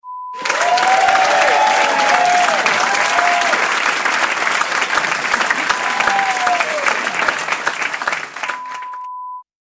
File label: Applause sound